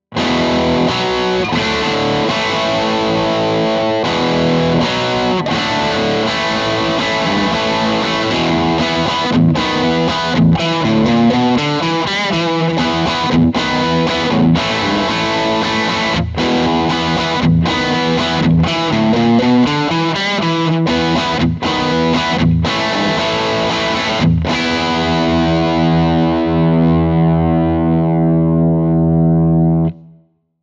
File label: rock chords; jensen tone